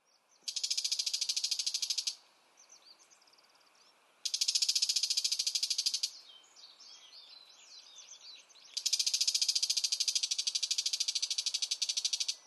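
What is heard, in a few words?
bull-headed shrike, utsunomiya; mozu; koe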